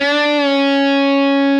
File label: solo bend half release